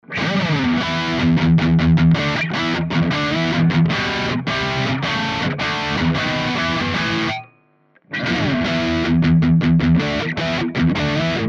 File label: Higain; GTRS; Preset Morden